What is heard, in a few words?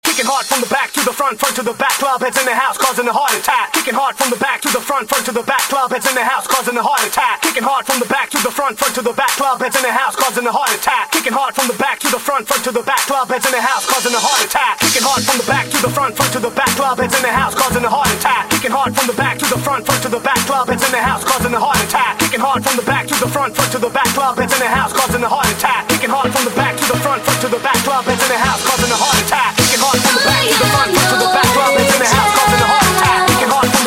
Clap A Pella Intro Club House, 130 Bpm